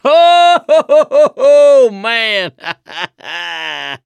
laughter, mechanic, survivor; voice

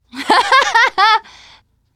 laughter, teen girl; player; survivor; voice

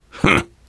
laughter, survivor voice biker

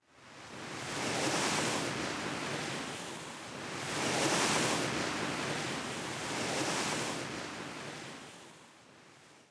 tree wind, forest

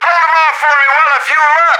radio button; Left 4 Dead 2; left4dead2 dlc3; planepilot; npc

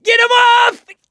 scream while pounced, mechanic, survivor

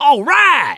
positive noise, mechanic; voice

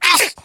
pain short; spitter

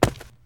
tile, footsteps infected run

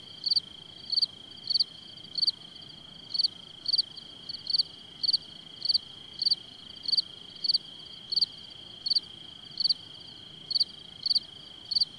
cricket loop, ambience; Left 4 Dead 2

